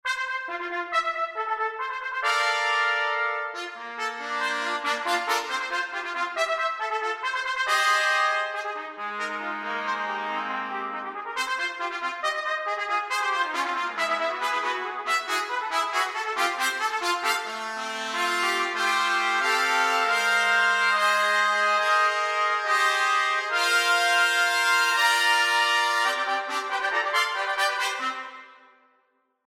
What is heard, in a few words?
Fanfare